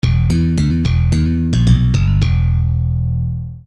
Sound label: Bass